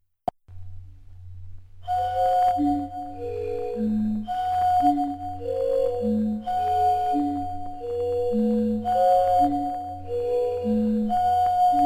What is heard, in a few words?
jingle